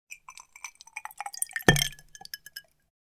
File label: Pour drink into a glass with ice